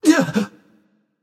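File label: nonverbal surprised; smite; voicepack; merlin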